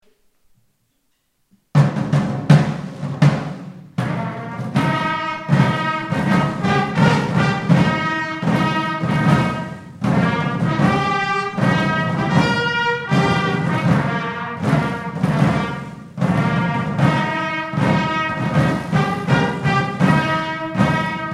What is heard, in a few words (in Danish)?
engelsk march med fanfare